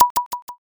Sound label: rimshot